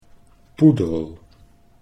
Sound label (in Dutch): poedel